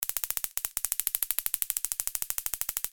warfare taser gun

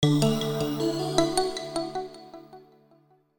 multimedia logo; sound effects one

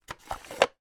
foley container box wooden small lid slide closed

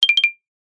cartoon flutter delicate

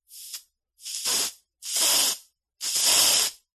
aerosol deodorant spray x3 weak; household

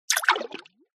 multimedia game designed water movement

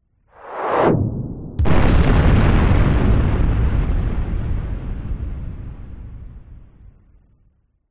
warfare missile incoming whizz by then explosion

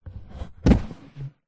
leisure board game box close; telstrations

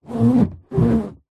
balloon inflated rub muffled; zapsplat

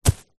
footstep single soccer boot run grass; zapsplat; foley

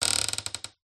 household antique larder door creak; zapsplat

